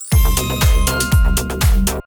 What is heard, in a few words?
win; Amatic; Fruit Box